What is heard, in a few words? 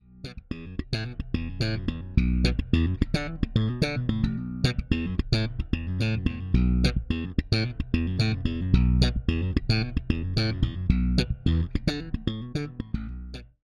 Ibanez aktiv bass boost cut treble boost; 460